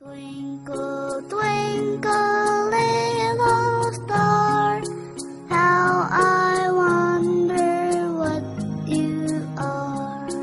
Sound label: lullaby twinkle star